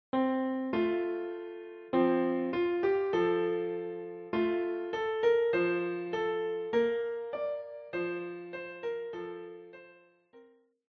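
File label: piano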